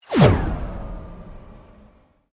Sweep Mechanical Fast; Foundation; Created sweeps, swooshes and other movement effects; Sweeper; Sound Design

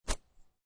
Golf Miss Hit; Sports

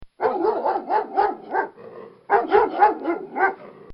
Doberman Pincher Bark; Dogs (Sound Effects:Animals)

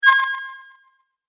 multimedia rollover, Multimedia Rollover Tone

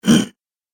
Zombie - Mmm; Zomby; horror

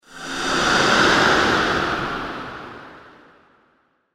Breathy Transition Swoosh; Scary Soundscape; Horror; Crafted Signals

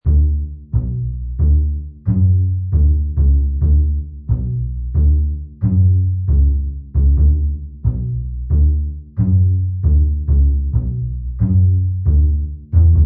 Horr Bass Bm, Bass horror loop at 135 bpm.; Bass Loops

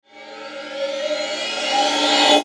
Ascend Flange Reverb Swell; Blastwave

